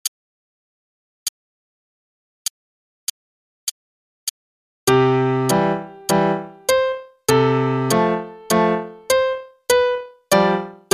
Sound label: Trumpet Concerto (qn=100); Faber; Adult All-in-One Book 1